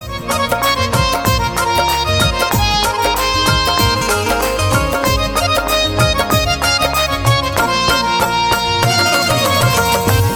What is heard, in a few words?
Instrumental Ringtone